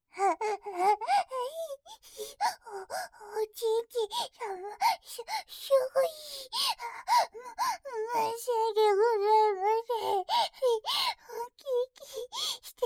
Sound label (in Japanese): はぁっ はぁっ あひぃ…